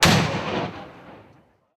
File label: outgoing rpg